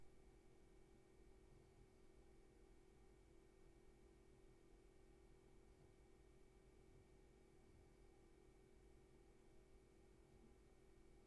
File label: room tone